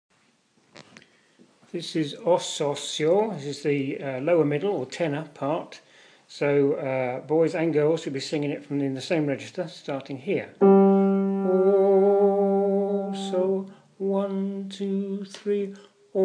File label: O so seo Lower middle or Tenor